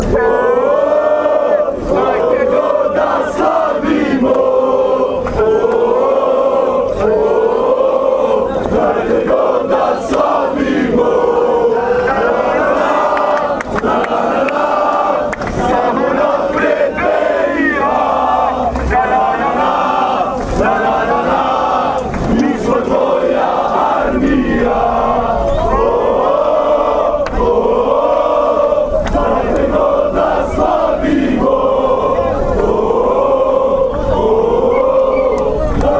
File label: woah woah, chants